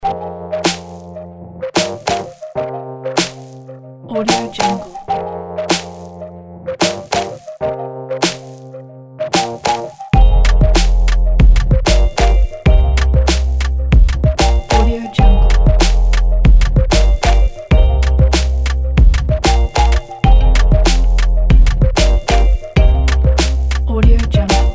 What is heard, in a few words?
urban pop